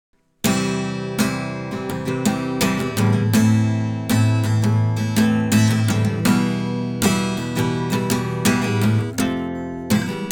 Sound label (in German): Gitarre